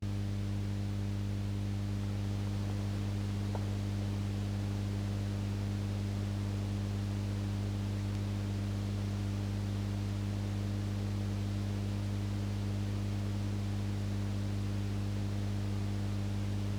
Guitar Amp Hum; SFX; IRL Recorded

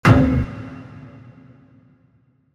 Metal Hit

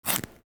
Pencil Sharpener; SFX; IRL Recorded

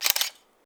Camera Taking Picture; SFX; IRL Recorded

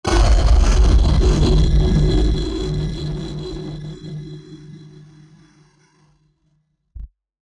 Broken Machinery; SFX; Ambient - General

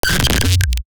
Artifact, Electronic

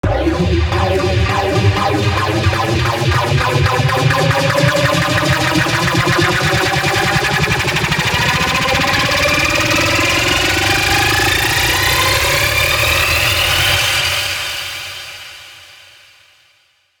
Synth Up, Risers Uplifters; SFX

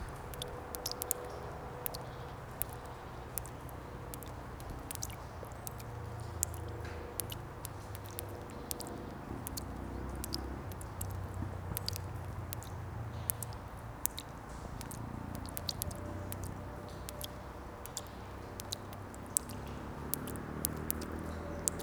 Water Droplets; IRL Recorded; Rain - Thunder

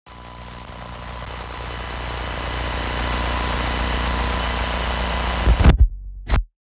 Mic Static; SFX; IRL Recorded

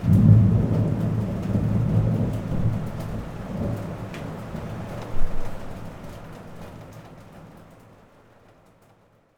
Thunder

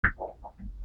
Pops; SFX; Experimental - Other

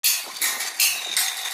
Wind Hitting 160 BPM, loops

